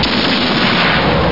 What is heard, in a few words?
exp4f; batlords